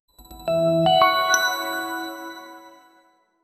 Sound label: upbeat energetic logo rev